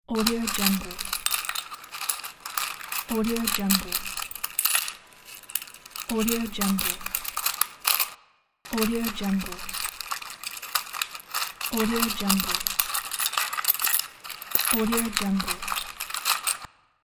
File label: counting coins; bugabugasound